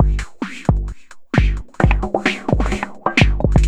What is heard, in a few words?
Beat (130BPM), techno; techno drum fx